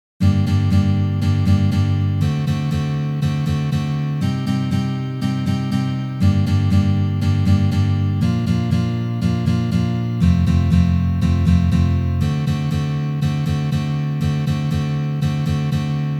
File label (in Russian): Uprazhnenie s akkordom D7